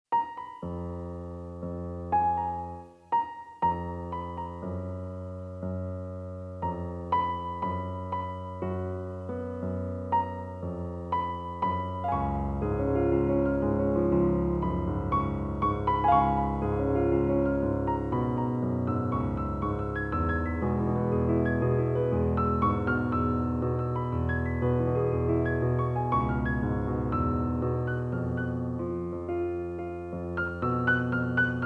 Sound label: Piano